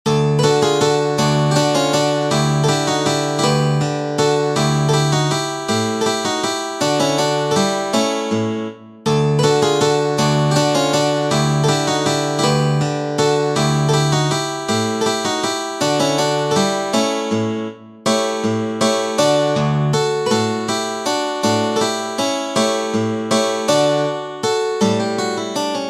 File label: Valse, guitar; Fernando Sor